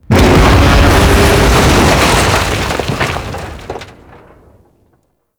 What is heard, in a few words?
Skiff Crash Bomber Impact; Cinematics